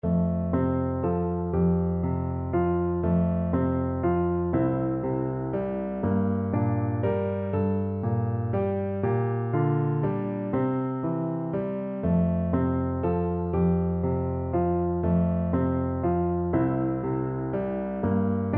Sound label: rock a bye baby, piano duet